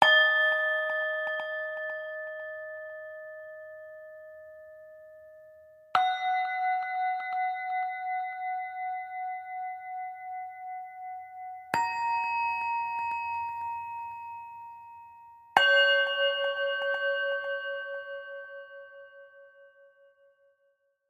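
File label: Village Bell music; the unfinished swan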